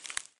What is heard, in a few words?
branch snap